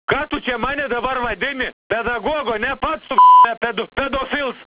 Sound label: knife; cstrike